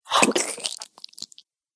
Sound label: Weapon Swish And Blood Spills; Battle Sound Effects